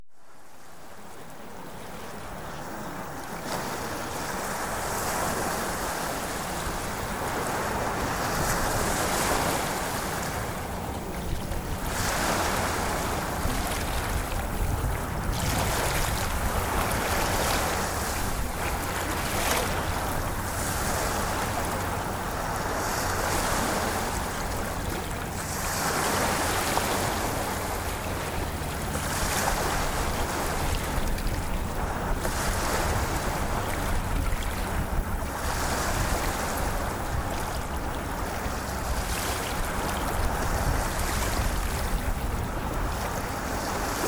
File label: Ocean Waves